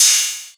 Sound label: Rides, Cymbals; Vengeance; Essential Clubsounds Vol.4